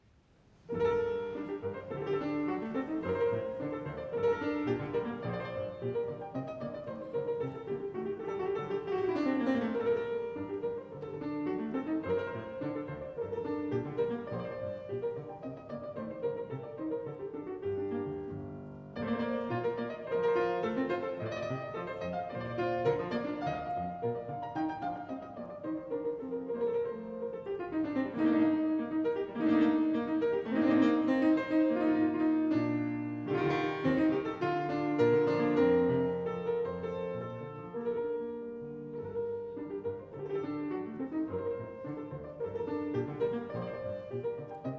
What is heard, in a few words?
piano; Rameau; Les Sauvages